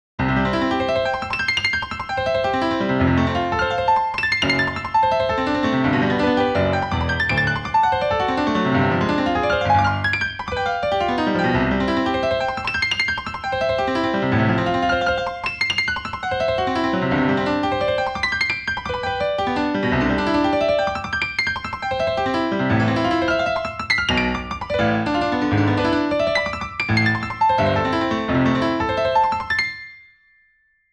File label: Chopin Reverb Transcribed